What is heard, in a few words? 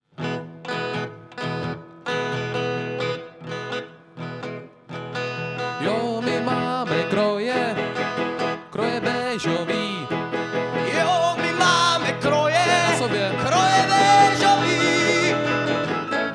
Four Chords Krojblues